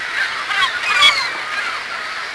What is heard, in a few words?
seagull; WhiteOut